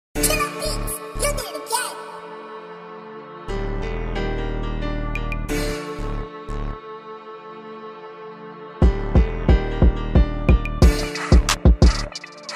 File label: Instrumental